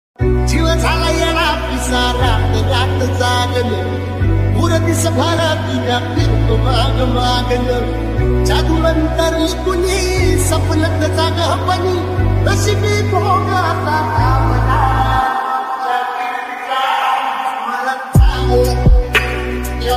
Marathi ringtone

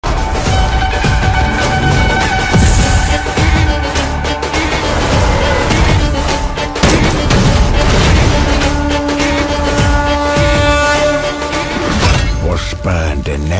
trailer bgm ringtone; Pushpa 2 The Rule; rashmika mandanna

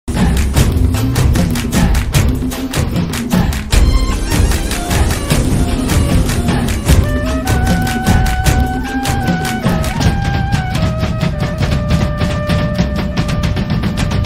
song bgm ringtone; ponniyin selvan

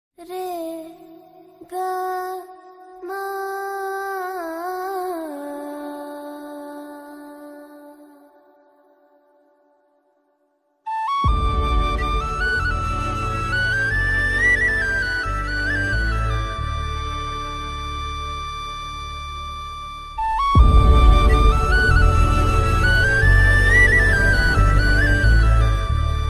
interval bgm; 777charlie; rakshit

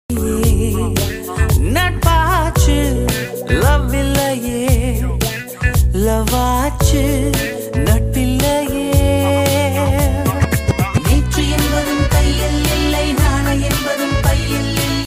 tamil song; chennai

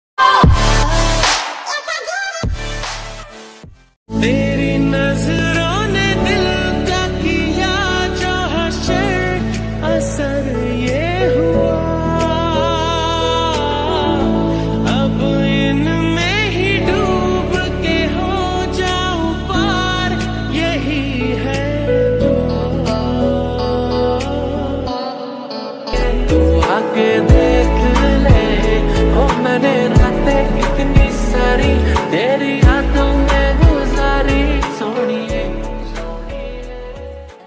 ringtone lofi ringtone mashup ringtone

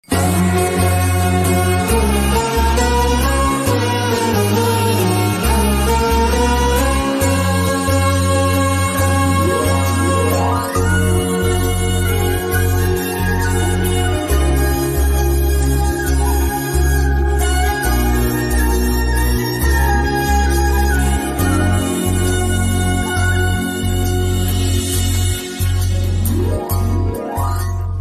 love bgm ringtone; bangarraju; naga chaitanya

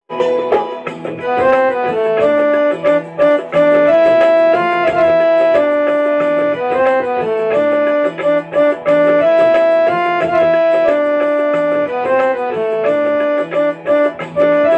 hanuman chalisa only music

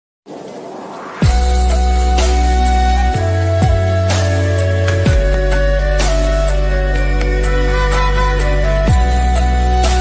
ringtone music

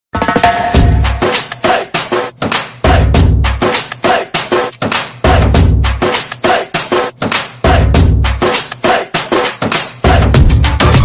song background original score bgm; maanadu; tamil

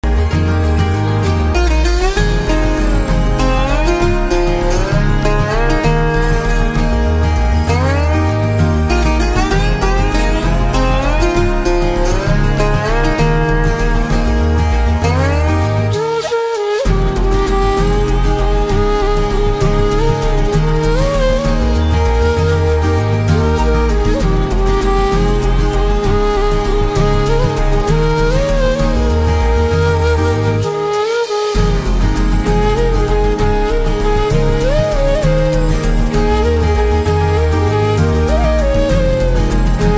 epic bgm ringtone

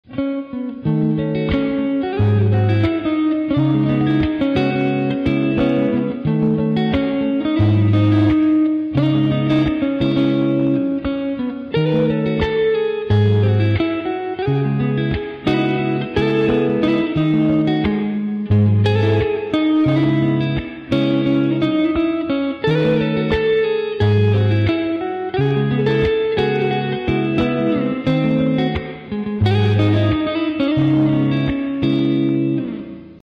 instrumental

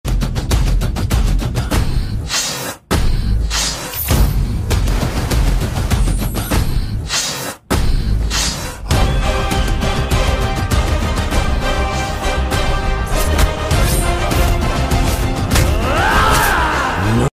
Telugu Trailer BGM Ringtone; kanguva

